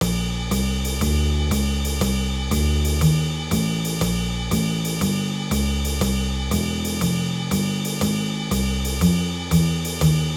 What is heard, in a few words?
Jazz Swing Basics, bateria